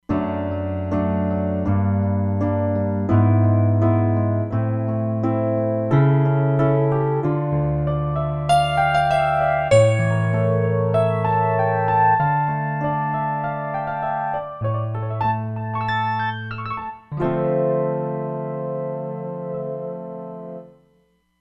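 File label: Blend Piano, Roland Fantom XR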